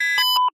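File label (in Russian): тревожный своеобразный компьютерный звук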